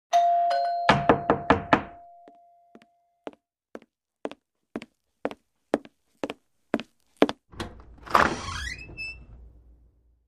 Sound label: Doorbell Ring